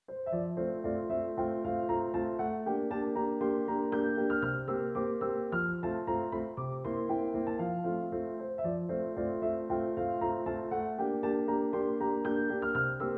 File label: Vals Gallopader for Pianoforte; af Kalliwoda